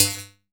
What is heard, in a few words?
noise percussion; AKAI S6000 CD-ROM - Volume 5